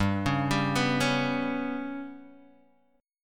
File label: G Major Flat 5th G, Guitar Open D; chordshape; down